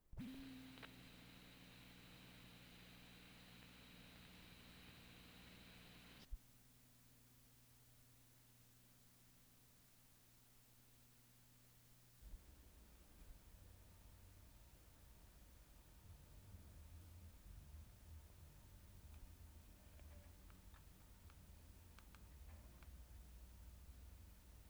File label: melodica